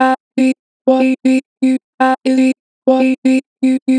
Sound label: Chop, Harmor Vocals; 2 bar flat; Pitch Flat